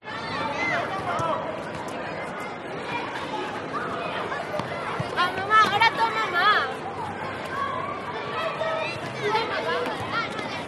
SPANISH CROWD Patio 30 40 Kids Playing; gain walkers

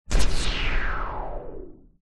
sound design cinematic hit stab filter tail

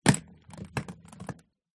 Ski Binding

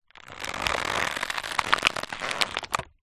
plastic packet squeeze stress; foley

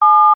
household telephone dtmf tone 7 short; zapsplat

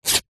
foley clothes running shorts polyester rip

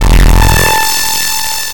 science fiction radio receiver static burst

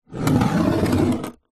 foley stone scrape wooden surface; zapsplat

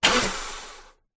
vehicles car false start very short

